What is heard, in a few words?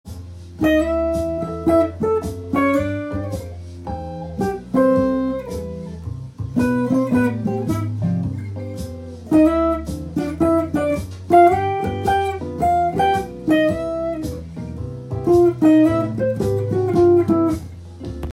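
electric jazz solo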